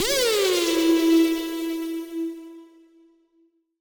Siren Horn down, Siren-Horn Type Hits, Ramp Down; future rave

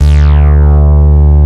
MOOG BASS, Moog Synths; E-MU Formula 4000 Series Vol. 3; Analog Odyssey

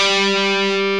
GUITAR, POWER GT

